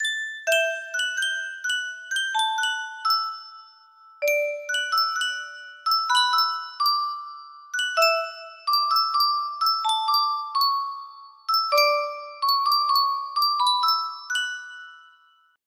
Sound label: Yunsheng Music Box, music box melody; mash